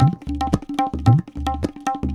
tabla funk 110

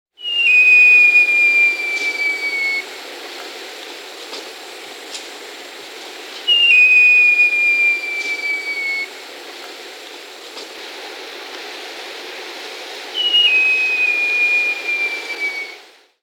Great Jacamar (Jacamerops aureus), Peru; S. America

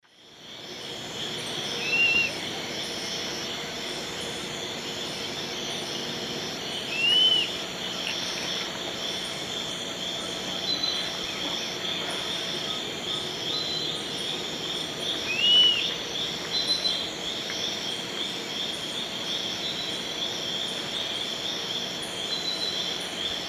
Tolmomyias assimilis, song; S. America; Eastern Lowlands

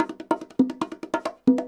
bongos 120 bpm, latin

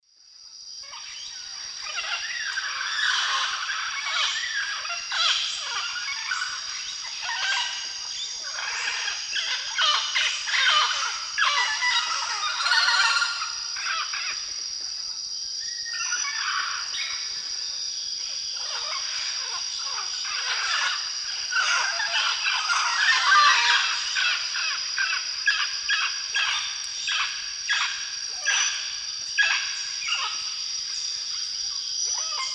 Amazona farinosa, calls; S. America; Eastern Lowlands

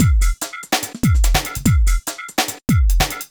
cracker 145

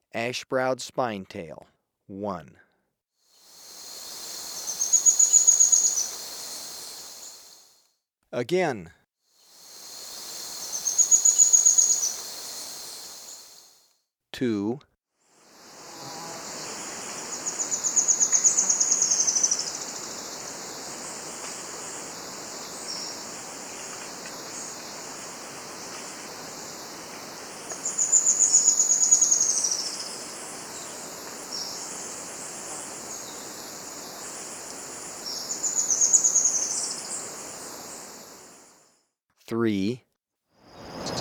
Ash-browed Spinetail; S. America

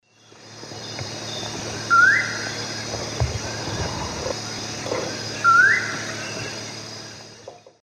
Lurocalis semitorquatus, flight song; S. America; Eastern Lowlands

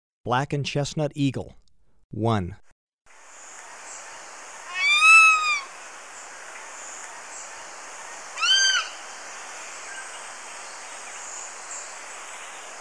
Black-and-chestnut Eagle; S. America

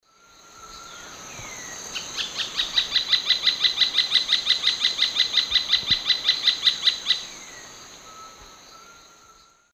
Veniliornis passerinus, calls; S. America; Eastern Lowlands

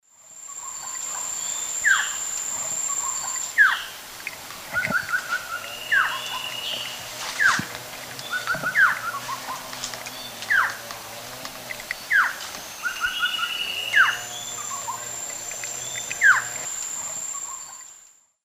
Thamnophilus amazonicus, calls; S. America; Eastern Lowlands

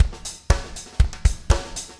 4 on floor 120, loops, 120bpm

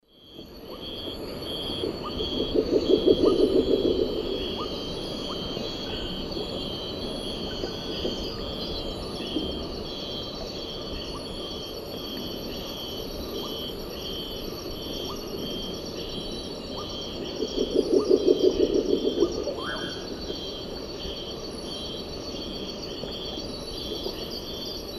Pulsatrix perspicillata, song; S. America; Eastern Lowlands

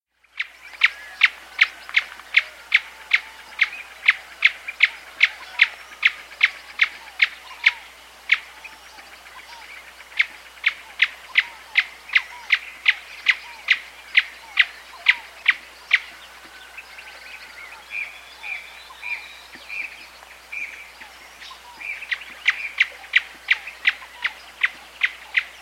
Black-Bellied Antwren; S. America

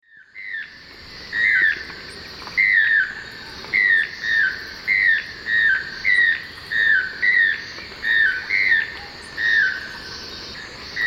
Ramphastos vitellinus, duet; S. America; Eastern Lowlands

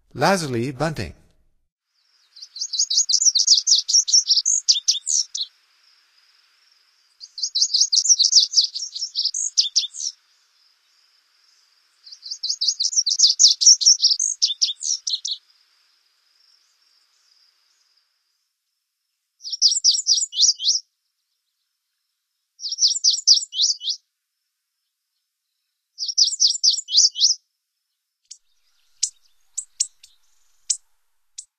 Lazuli Bunting, Songs of Birds of North America; Stokes

